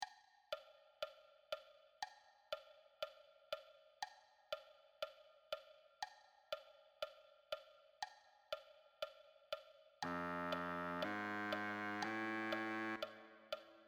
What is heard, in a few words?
Baritone Sax